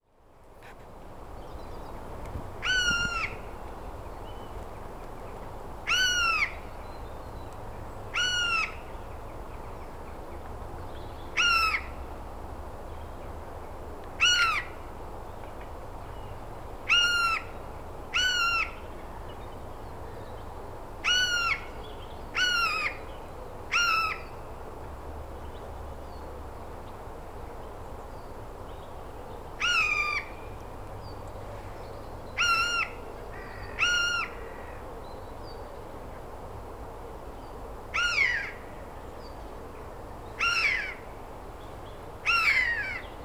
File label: Garrulus glandarius; SETE